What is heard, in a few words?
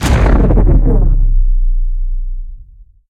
cinematic impact hit upfront warped ripping thundering long warbling tail; sonniss gdc